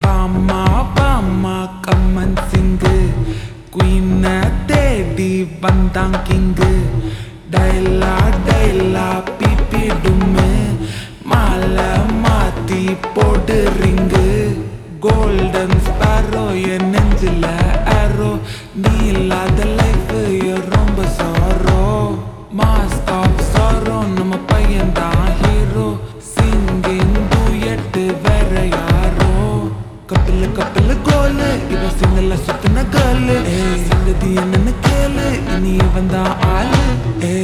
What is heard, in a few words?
Ringtone Slow Reverb; tamil